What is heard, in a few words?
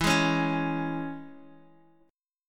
E 6th, down, Guitar, Open D